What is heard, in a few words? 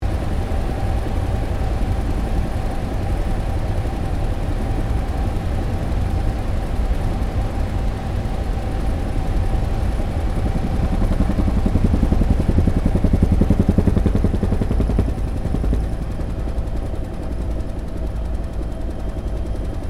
HELICOPTER 1967 BELL UH1 IROQUIOS TAKEOFF INTERIOR; RAN UH-1 Iroguios; Vehicles; Aircraft; Helicopter Sound Effects; Helicopters (Sound Effects:Vehicles)